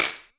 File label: Buzzy Wak; drum machine